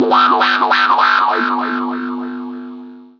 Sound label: jungle feedback; Weird and Interesting Hits